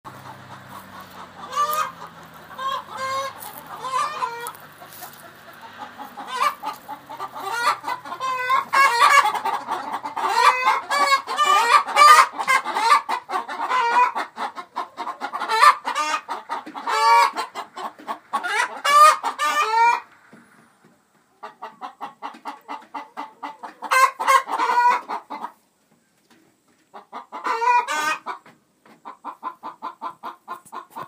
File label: Chickens Laying